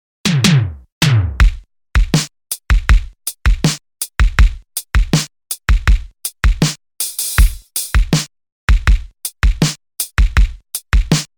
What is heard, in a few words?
SP 707